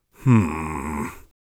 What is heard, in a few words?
inquisitive, Warrior Voice; Warrior Voice Pack